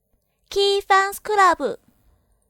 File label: vocal logo; KFC同人声乐作品; 歌姬作品